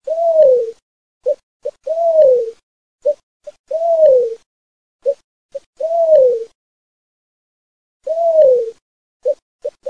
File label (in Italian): PMONTANERA